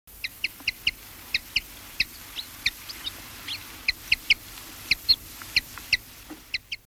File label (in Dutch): kneu, trekroepjes; tijdens de vogeltrek